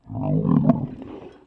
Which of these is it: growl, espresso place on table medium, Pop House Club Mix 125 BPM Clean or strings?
growl